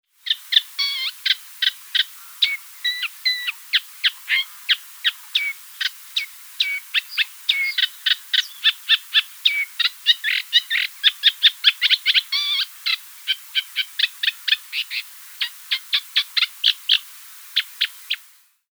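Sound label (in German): Teichrohrsänger Gesang; uni-kl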